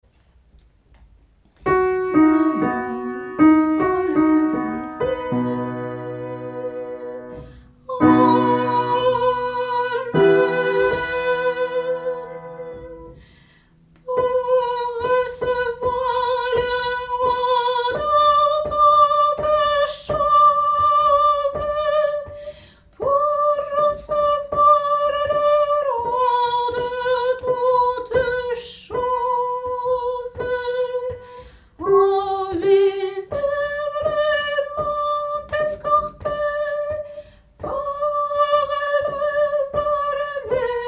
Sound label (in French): Cherubins Tenor; Cherubins part2